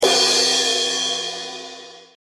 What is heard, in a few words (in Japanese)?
シンバル ジャラーン‼